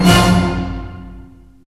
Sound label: ORCHESTR HITZ